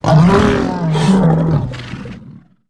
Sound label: bantha dead; Star Wars Knights Of The Old Republic I; XBOX